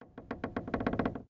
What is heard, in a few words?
Creak Wood